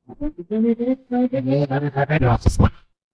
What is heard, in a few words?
transition riser